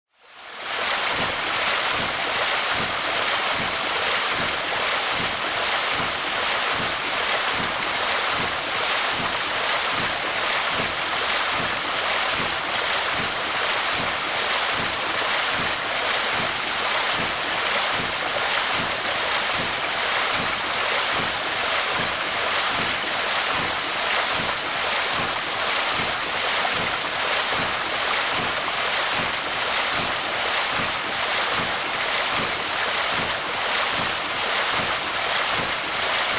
Water turning the wheel of a hydro turbine; river

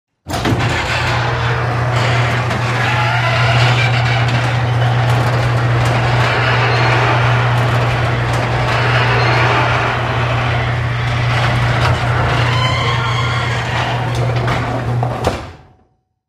Garage electric door opens, closes

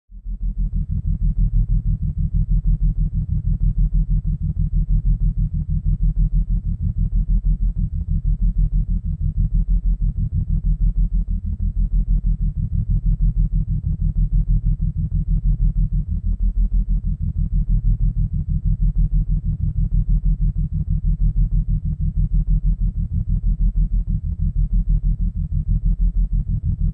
Low frequency electronic signal; radio signals

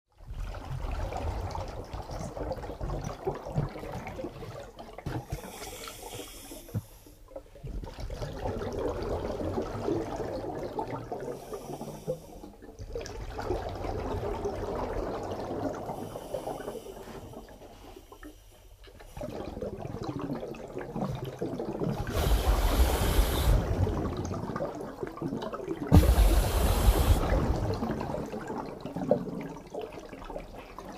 Diving, a man swims underwater

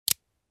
Flick knife; cold weapons

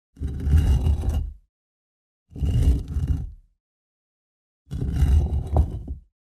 stone dragged on stone

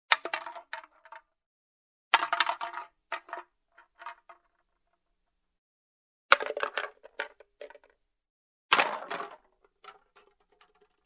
falling tin can; tableware

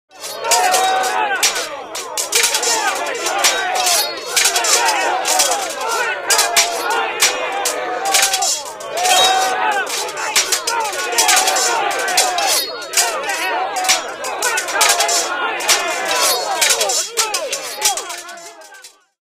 Big crowd sword fighting, people screaming; cold weapons

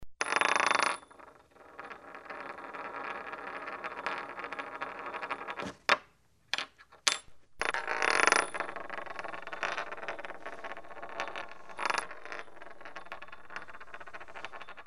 Spinning a coin on the table; money

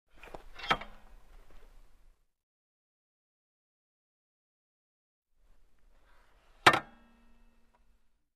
Motorcycle is put on center stand

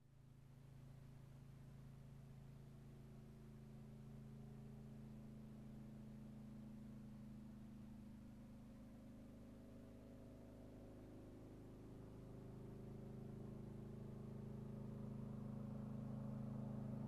Motorcycle approaching, turning off

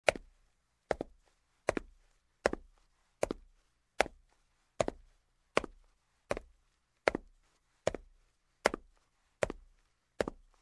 Steps on the marble floor in shoes

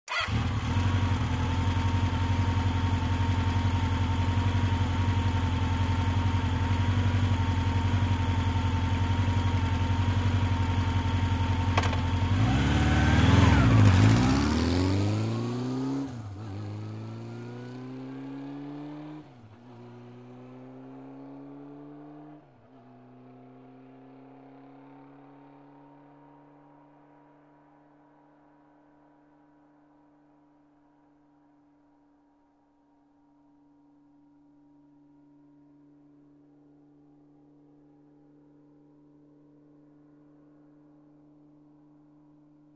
Motorcycle starts, waits, drives quickly

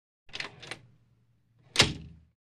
Wooden door opens, closes

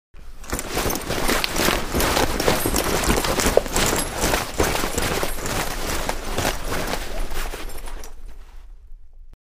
Running, rustling, metallic ringing; steps

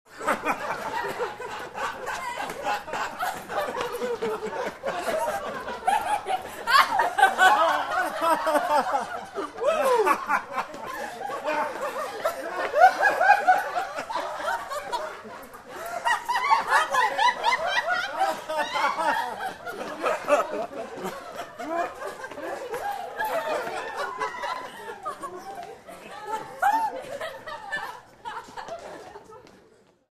Laughter of a small crowd

